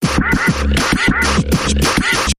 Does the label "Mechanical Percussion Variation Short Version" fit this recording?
Yes